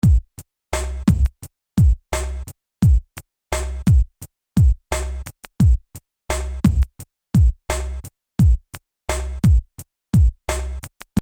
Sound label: MPC 2000 XL